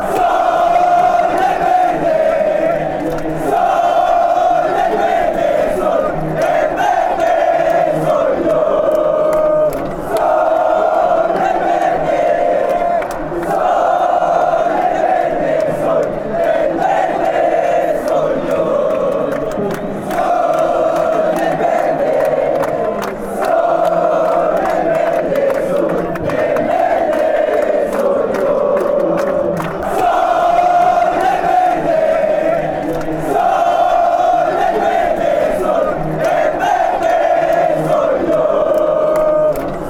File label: atletico nacional chant